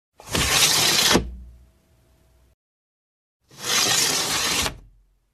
zvuk vydvijnogo okna

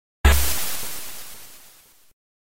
vsplesk vody

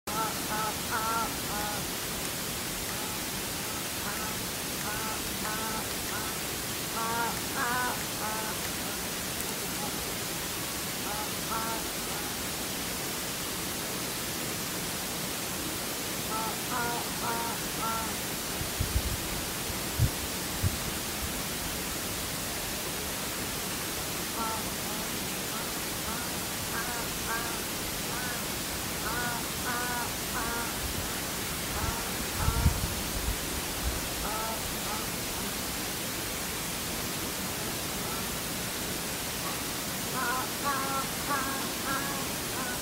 manul miau

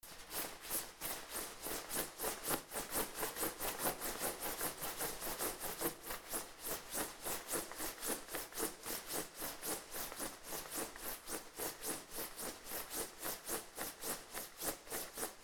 beg v zimnei kurtke

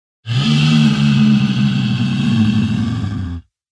vdoh orka

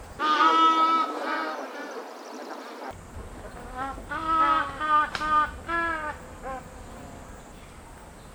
ibis krasnonogii

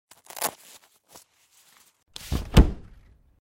zvuk zonta

